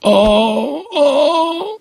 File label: Auu, Auu!; Simpsons